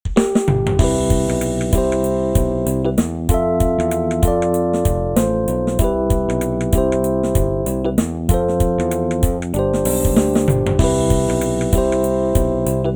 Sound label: Intro Loop; Kirakira Stars Idol Project Nagis